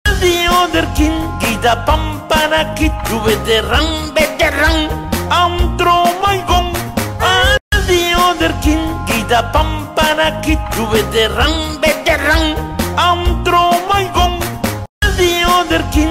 Dancing triangle

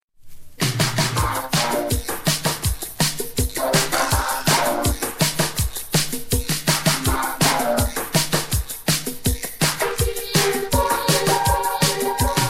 Mambo

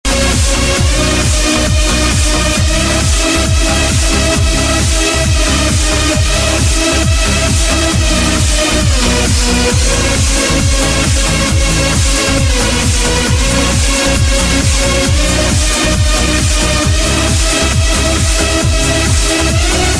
Trance

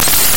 Police Sound

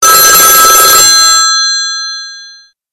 Antik Phone

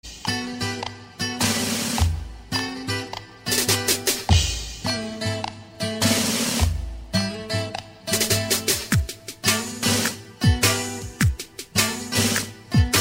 Lovely Ring

